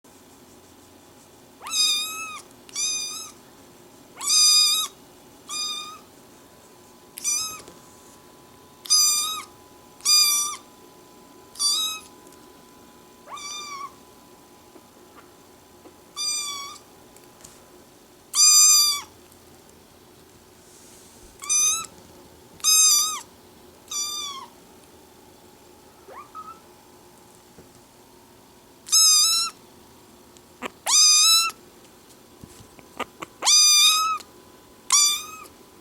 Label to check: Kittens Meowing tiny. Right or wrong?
Right